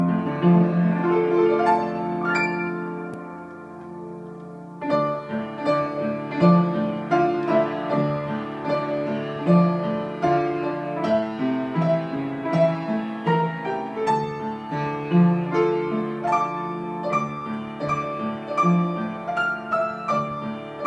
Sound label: Piano